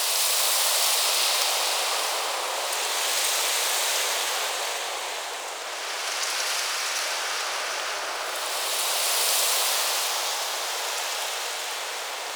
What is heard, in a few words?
Operator Room Glowing Tree Loop; Warframe; Ambience; Orokin